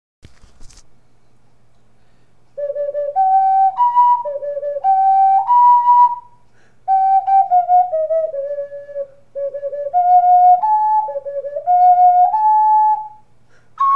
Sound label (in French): ocarina cucaracha